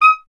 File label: clarinet D#, Solo Instruments